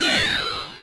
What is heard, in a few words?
screams alien